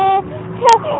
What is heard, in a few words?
crying, baby cry